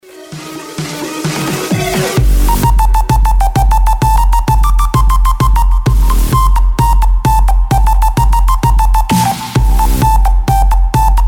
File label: bass and sirens